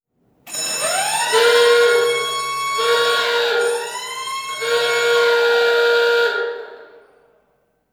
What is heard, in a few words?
Sound Effects Gunge Tank; Noels House Party